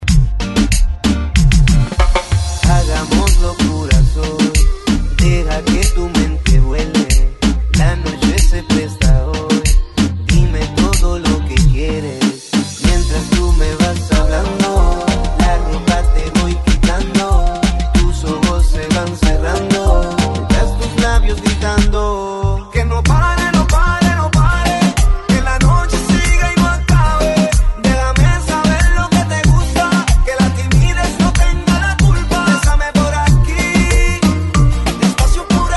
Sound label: Extended 94Bpm; DJDOLLS